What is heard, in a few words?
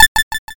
toggle on